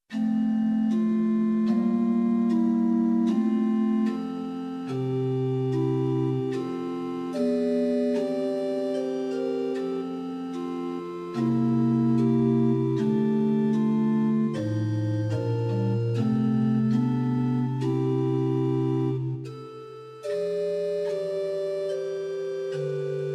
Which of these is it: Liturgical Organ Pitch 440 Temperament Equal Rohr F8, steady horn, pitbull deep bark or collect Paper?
Liturgical Organ Pitch 440 Temperament Equal Rohr F8